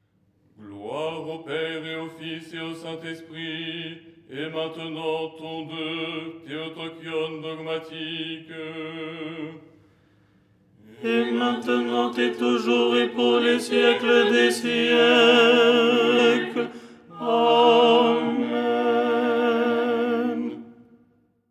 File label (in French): tenor